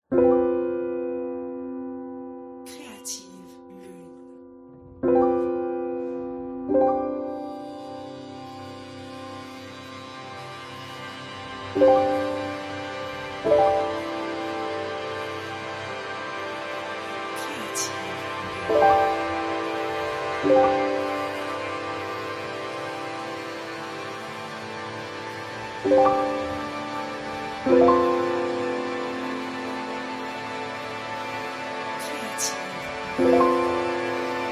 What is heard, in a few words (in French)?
piano effets